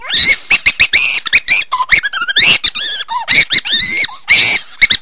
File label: High Crazy Tone